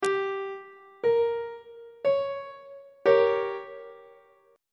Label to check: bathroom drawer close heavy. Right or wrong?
Wrong